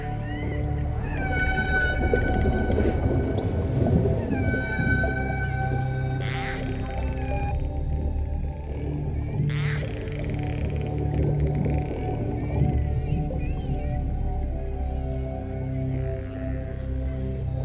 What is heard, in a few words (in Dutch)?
Onderwater Prikkelend